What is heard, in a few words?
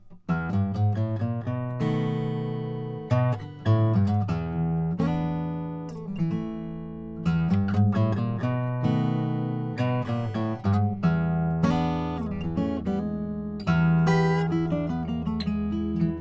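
froggy bottom h12 brazili, guitars; 50th anniversary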